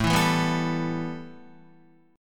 A 7th Suspended 2nd A, Guitar; strummed

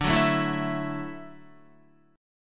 D-Major Add 9th-D-down-Guitar; strummed; JGuitar

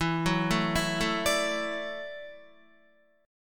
E 7th Suspended 2nd Suspended 4th, down, Guitar; E7sus2sus4 arpeggiated; JGuitar

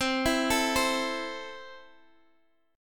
C 6th, Guitar, Drop D

